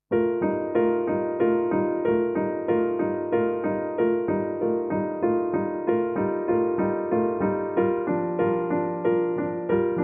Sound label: piano